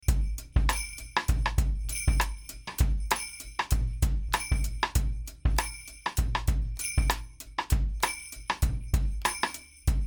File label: beat